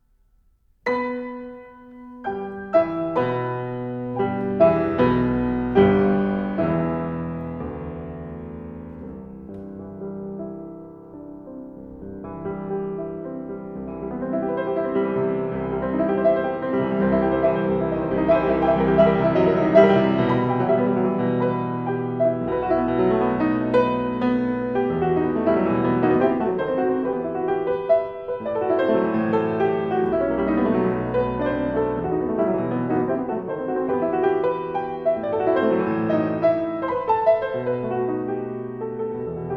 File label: Piano Sonata in E minor; Niels Gade (1817- 1890); Sonate, op. 28. – Allegro con fuoco